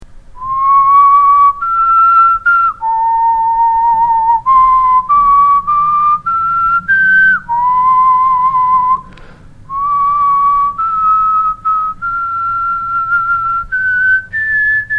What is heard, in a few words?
Whistled O Canada